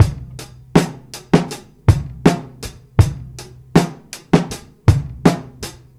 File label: Live Beats, R&B Drums